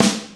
dry steel snare drum g sharp key; sample

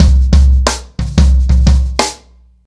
121 bpm breakbeat sample c key

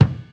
smooth kick drum sample c sharp key; kick drum samples

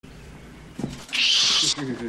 BAT SQUEAKS AND LAUGH

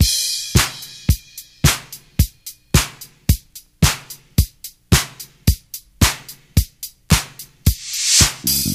110 bpm breakbeat e key

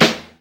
sharp snare sample a sharp key

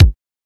old school shiny kickdrum f key; kick drum samples

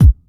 tight lows kick drum single shot c key; tuned drums

